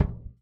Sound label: Subdued, Kicks; Airwindows